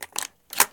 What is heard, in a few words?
shotgun reload; weapons; hl2